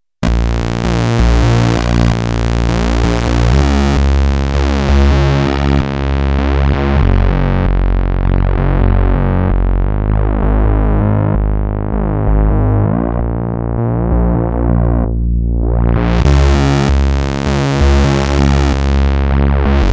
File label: synth pwm; Creamware minimax